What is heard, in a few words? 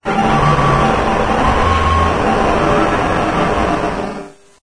citadel ambience; video game; Source Engine; beta citadel ambience